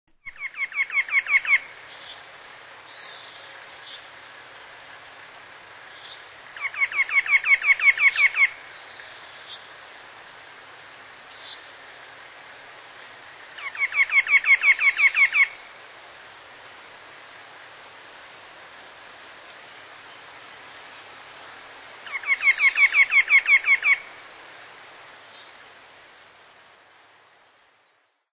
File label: White-breasted Nuthatch; Birds